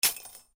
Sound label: buy or sell complete